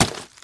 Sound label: melon impact; soundspvz